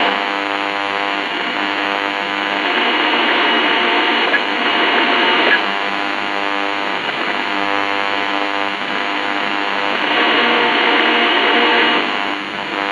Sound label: the sound of an old, broadcast